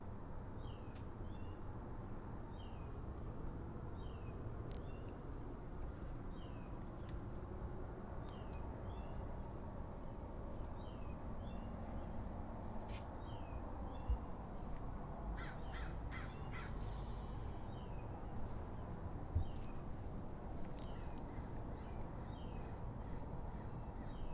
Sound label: Birds At Sunrise; lolicore